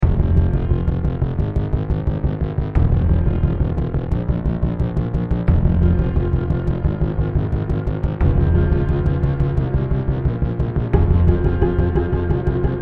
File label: start round, music